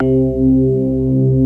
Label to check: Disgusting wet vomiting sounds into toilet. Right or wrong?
Wrong